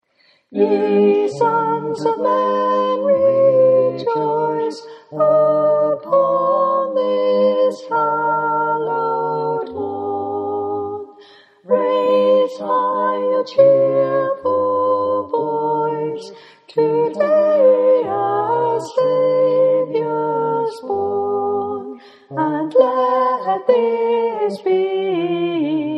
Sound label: Soprano